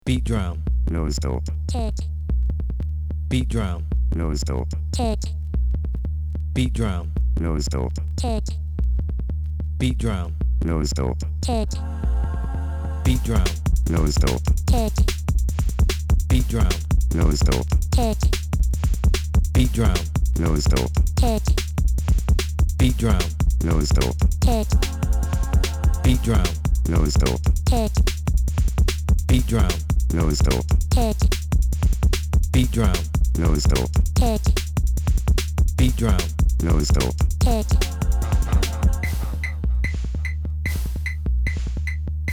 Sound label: beat drum